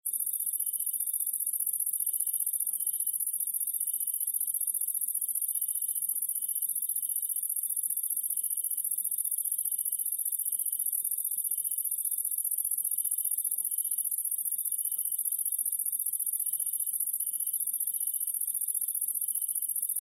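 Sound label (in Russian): sarancha, locust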